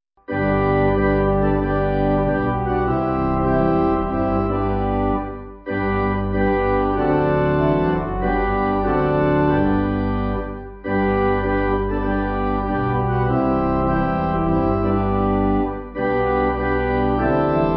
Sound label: Pipe; Theres Not A Friend; G.C. Hugg